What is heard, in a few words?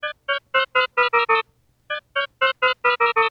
Perf Emerg, alarms